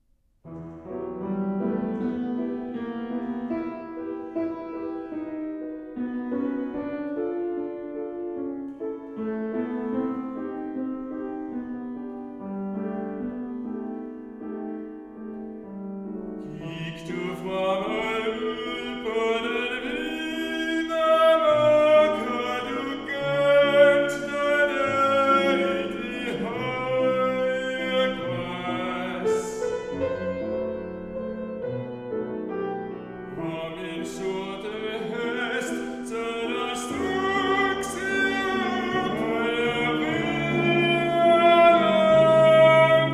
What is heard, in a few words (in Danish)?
Langsom Lyrisk